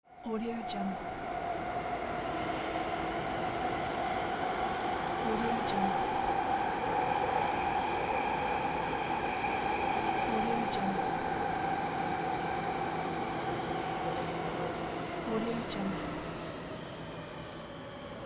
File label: inside moving subway train